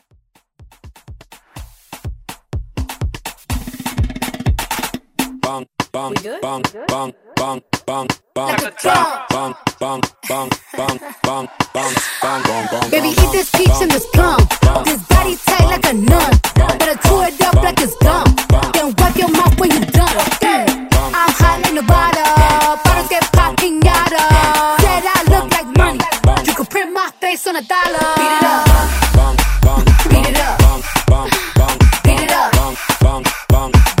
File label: Bongos Afro Redrum